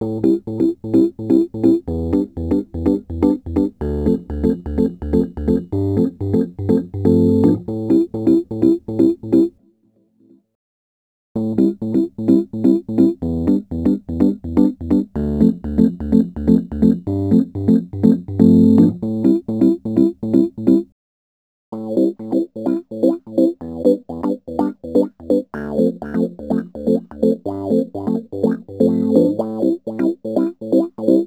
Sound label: Rhodes Attacks; Ueberschall